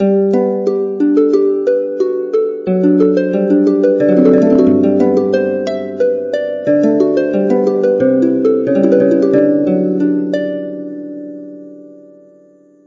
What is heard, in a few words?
harp; Haydn